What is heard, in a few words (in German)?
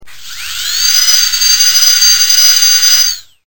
zahnarztbohrer